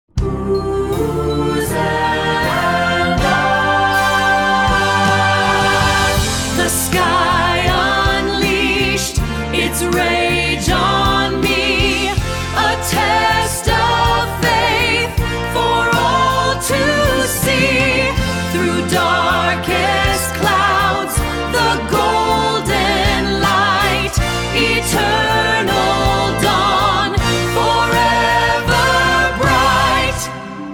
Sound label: structured storm; Lyria 3